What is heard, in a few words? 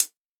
Closed HH, PsyTrance; Cymbal